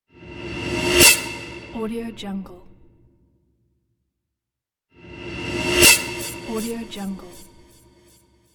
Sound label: whoosh tension movement